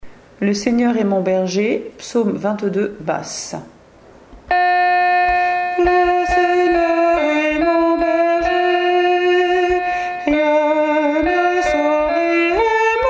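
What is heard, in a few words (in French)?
Psaume Le Seigneur est mon berger Basse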